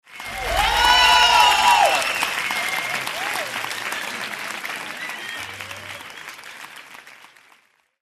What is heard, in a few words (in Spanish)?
aplausos